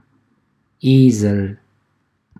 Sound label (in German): Esel